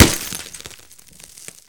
concrete, impact bullets; hl2

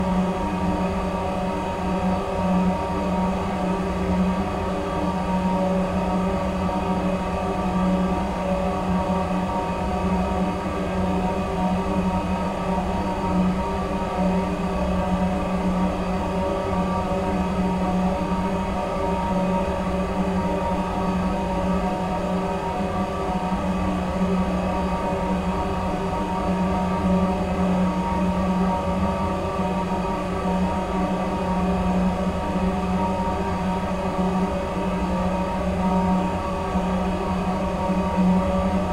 PodRacer Medium RPM, Turbines & Engines; Fox Audio Post-Production; Space Racer 2

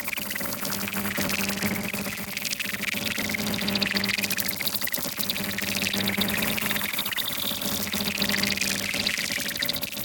UI Calculation Loop; Rescopic Sound; High Voltage

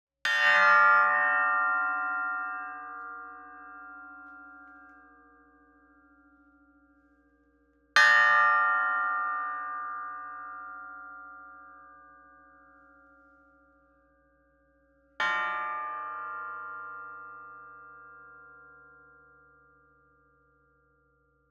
INT Metal Impact Resonant Ring Movement Low Pitch; AMBEO; Coll Anderson

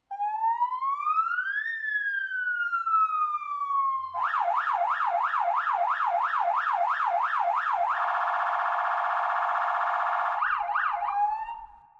Siren, South African Sirens; TheWorkRoom Audio Post

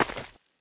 dirt, player footsteps; hl2

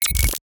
UI Click; Rescopic Sound

